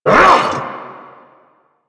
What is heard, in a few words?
Vocal Attack Short; Warframe; Duviri; Dax; Vocal Set A